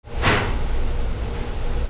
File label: INDUSTRY